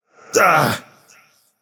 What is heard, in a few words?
grunt hit, voicepack; smite; vamana